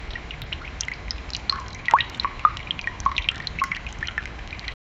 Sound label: dripping water in a caver